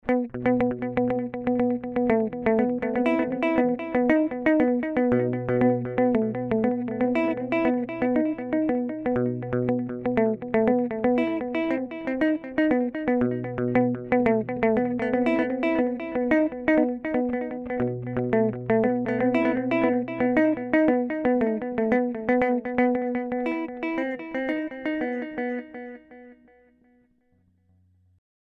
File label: Delay Digital; Specular Tempus